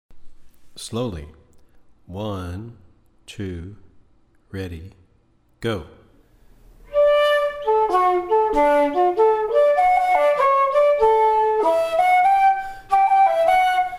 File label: Hornpipe slow